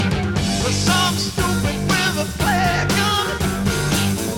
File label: music; wu xing; earth